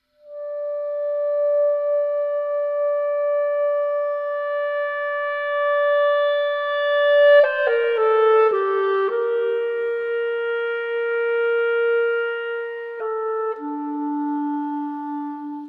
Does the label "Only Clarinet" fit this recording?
Yes